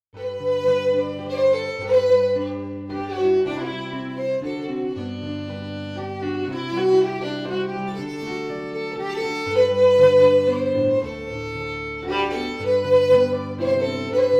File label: FIDDLE PIANO; Jink & Diddle Book o' Tunes 2021